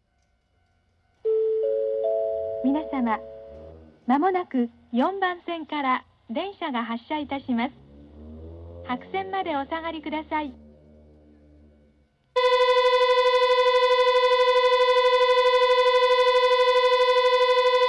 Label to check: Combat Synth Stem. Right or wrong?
Wrong